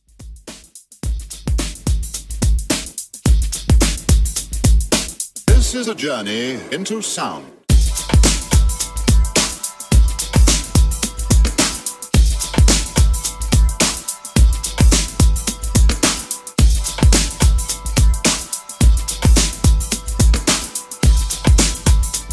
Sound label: 80s Redrum 108Bpm